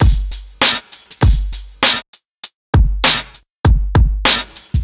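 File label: raw break loop; Rawcutz - Nu Forms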